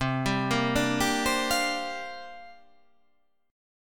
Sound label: C 9th Suspended 4th C down